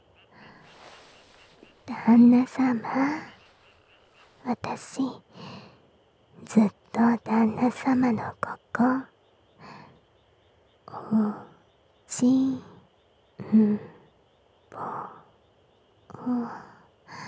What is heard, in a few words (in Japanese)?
囁き甘々 耳塞ぎ舐め囁きブースト 夏の夜 効果音あり; 挿入焦らし前戯; 自然の音あり